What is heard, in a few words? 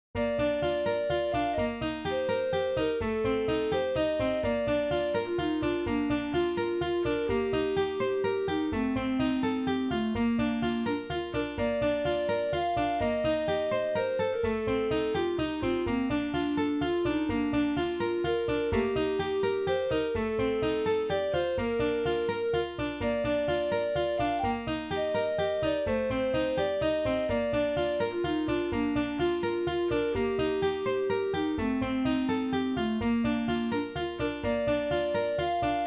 Roland SC-88; SimCity 2000